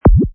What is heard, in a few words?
Wippa, Button sound; Button Sound Effects; Multimedia; Lynne Music